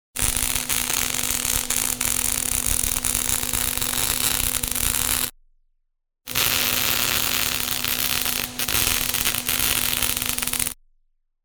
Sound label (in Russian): Svarka